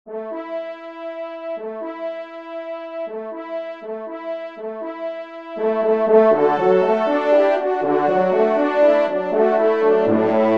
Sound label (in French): trompe; Retour de Chasse; Victor Viney